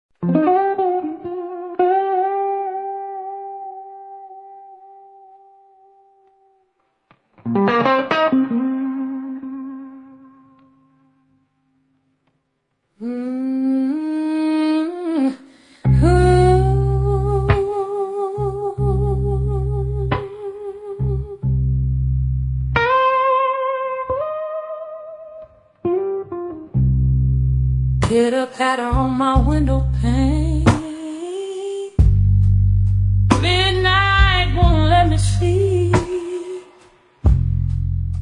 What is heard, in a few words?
Soul Deep Blues